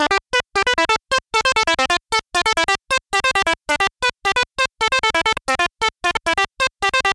Arp chord; arpeggio pattern; Reason

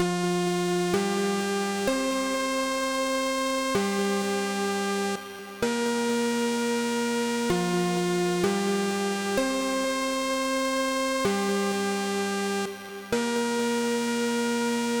melody; Ableton Live MIDI effects

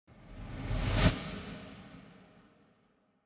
Snare Trem, tremolo

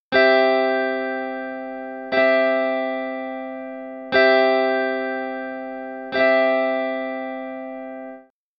Stereo Enhancer, epic drum; epic drum sequencing; QL Stormdrum

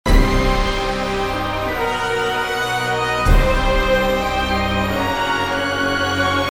counterpoint tutti, epic orchestral; epic orchestral track

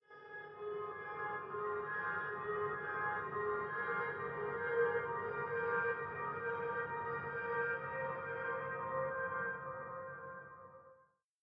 Pno Pad Trem, tremolo

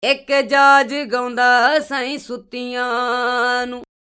DI Pitch; pitch correction for vocal effects

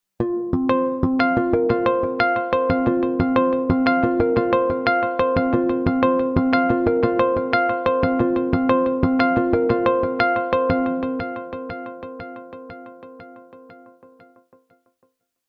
Harmonics; sus4 chord; film music

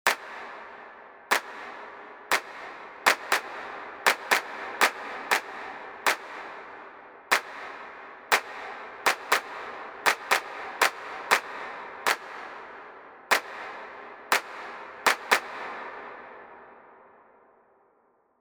Side, reverb duck; ducking; too wet

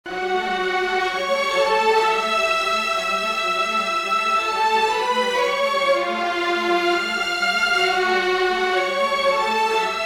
melody violins, The melody, played by strings; epic orchestral track

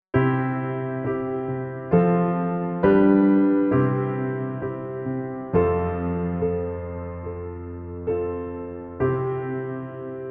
Plagal, A proper plagal cadence is IV to I; altered chords

